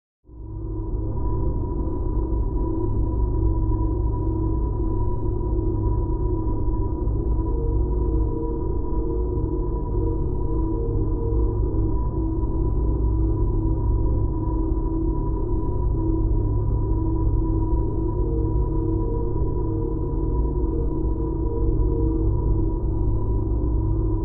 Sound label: Space Station Ambience